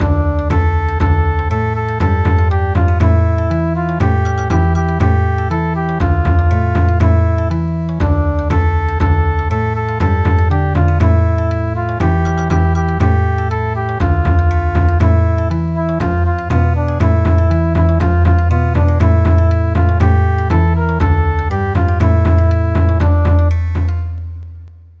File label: Bon Odori; traditional; Norwegian Lullaby